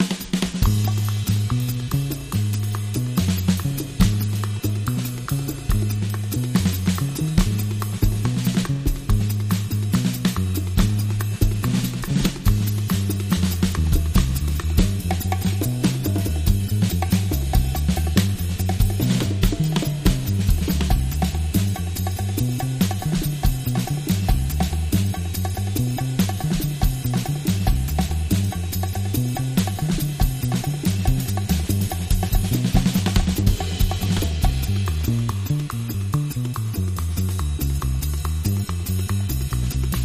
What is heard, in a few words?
Rhythm Underscore